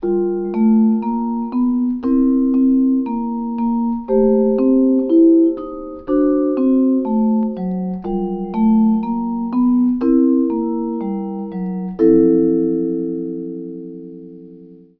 dampen

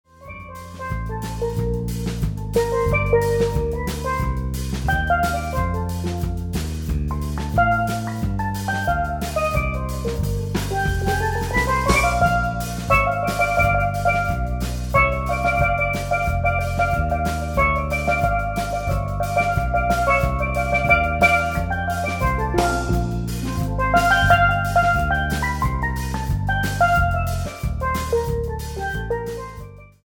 bass drum trio

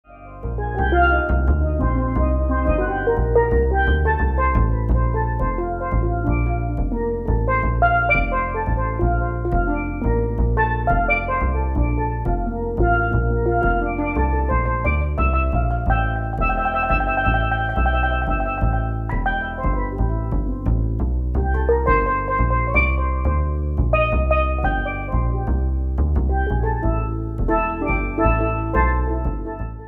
pan bass duo

